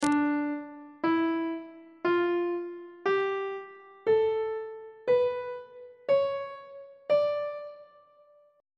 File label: d melodic minor scale reverse descending on treble clef